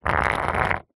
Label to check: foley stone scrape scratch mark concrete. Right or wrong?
Right